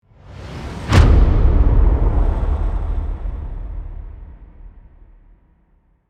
Cinematic trailer hit

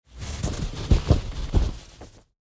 Silk bag manipulation material

